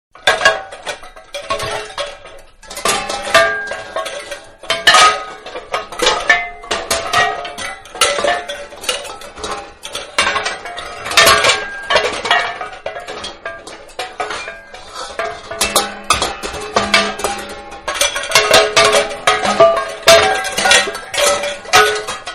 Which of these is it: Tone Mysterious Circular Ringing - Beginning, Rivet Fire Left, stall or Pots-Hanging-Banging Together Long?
Pots-Hanging-Banging Together Long